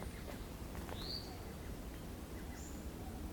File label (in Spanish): zorzal